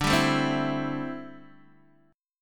D 7th Suspended 2nd Suspended 4th D, Guitar DADGAD; D7sus2sus4; strummed; x,5,5,2,3,2